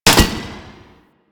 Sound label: Axe Recall Catch; Sundial